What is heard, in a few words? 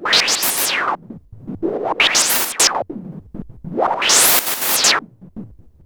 Yamaha CS5, Filtered Noise